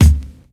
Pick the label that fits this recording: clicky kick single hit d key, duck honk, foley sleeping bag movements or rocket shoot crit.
clicky kick single hit d key